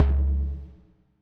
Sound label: Udu Fuzz